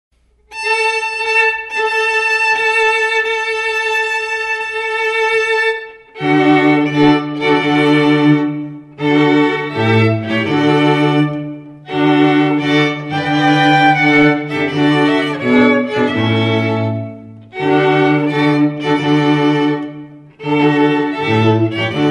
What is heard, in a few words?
Bridal Chorus, quartet